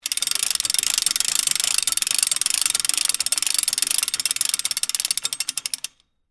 Hand Crank Wire, Hand, Crank, Wire, Rig, Long, Theatre, Stage; Foley; Film Set; BlastWave